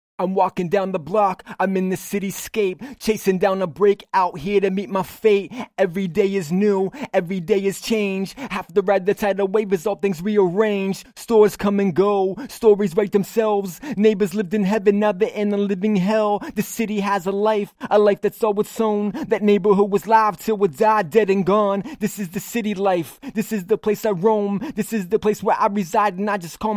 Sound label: HH 88 BM, Acapellas